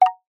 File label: multimedia tone short two tone wooden